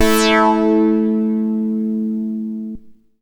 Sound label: MASS LEAD, SYNTH; Keith Emerson Signature Series